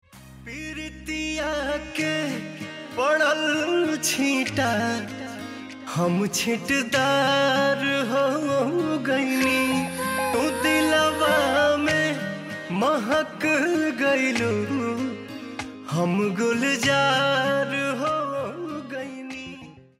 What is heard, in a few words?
Ringtone